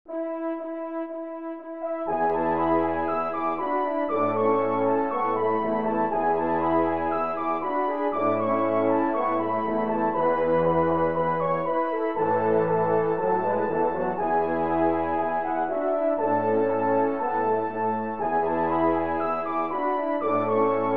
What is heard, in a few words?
french horn